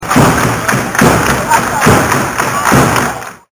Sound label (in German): trommel